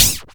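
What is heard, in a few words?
Snare, dry perc; Quasimidi Rave-o-lution 309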